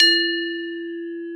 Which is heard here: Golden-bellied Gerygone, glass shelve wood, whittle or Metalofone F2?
Metalofone F2